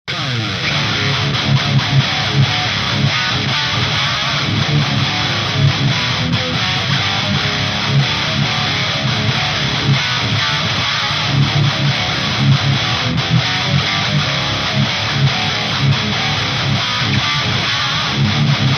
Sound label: marshall full drive 2 boost od 1 orange channel